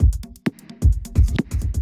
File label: electro kit 130